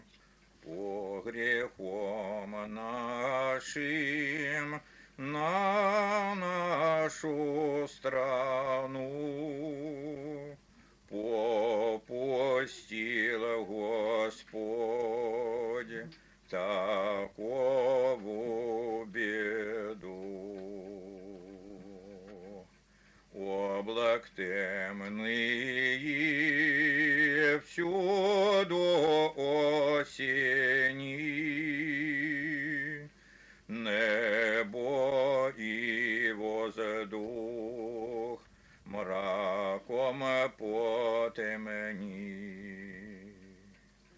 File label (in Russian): Плач соловецких иноков; По грехом нашим